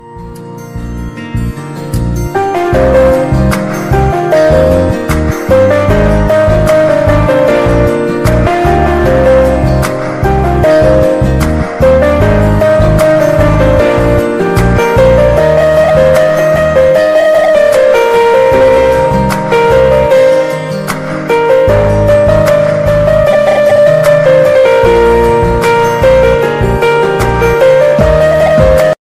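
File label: guitar bollywood music ringtone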